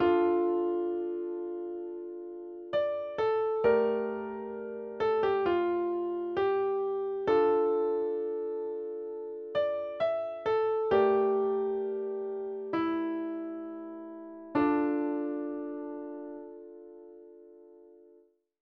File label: instrumental